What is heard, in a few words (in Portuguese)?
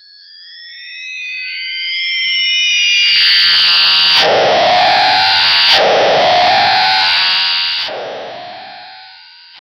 crie um som de um, sports car